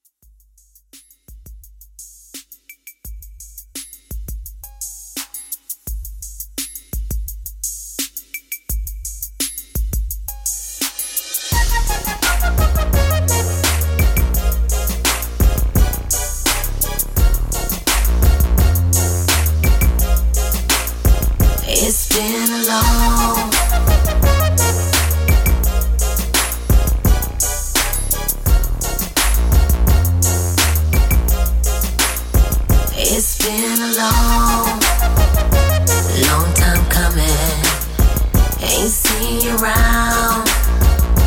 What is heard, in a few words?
Throwback R B Redrum 85 Bpm